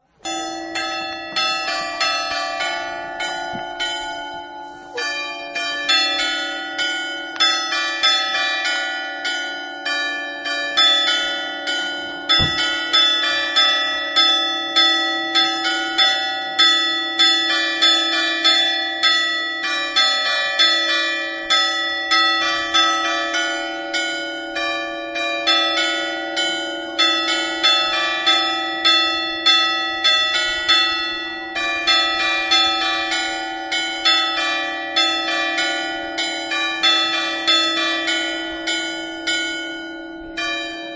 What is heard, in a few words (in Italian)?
campane; preval